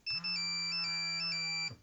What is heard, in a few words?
nokia 5510 ringtones city bird